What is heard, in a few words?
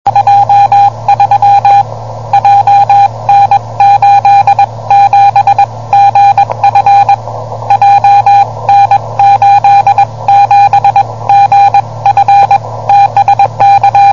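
CW, 144 MHz, amateur radio; HA1A JN87GF 359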